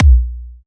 kick machine

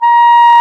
CLAR A#5; Roland - LA Composer vol. 1

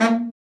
BRA BARI, Bariton Sax

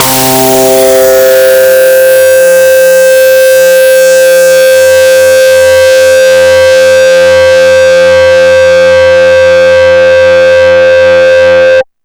DIST STACK; Big Beat Samples & More; AKAI

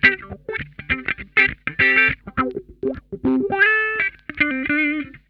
HIDE N SEEK, Funk Guitar; 90 s Sample CDs; Zero G

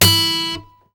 GUI AC. E, Ac. Guitar; Sound & Vision; Gigapack I CD 2; GUI ACOUSTIC 1

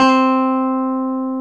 C4, CLAV; Sonic Reality; Vintage Timetraveler; CLAV PIANET

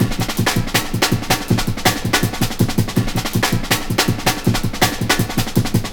breaks, Loops; M8

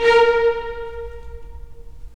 STR, Full Short; Roland - LA Composer vol. 4

